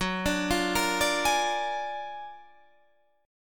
Gb Augmented Major 9th, Guitar

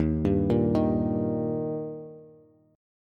Eb Major Eb down Bass; arpeggiated; JGuitar

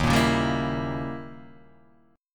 Eb 7th Flat 9th Eb, Guitar Half Step Down

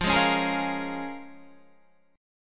A sharp Minor 7th

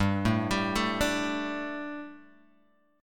G Suspended 2nd G, down, Guitar, Open G

